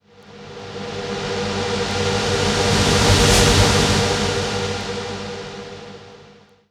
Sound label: Trance Sweeps; Vengeance Samples; VEC2 FX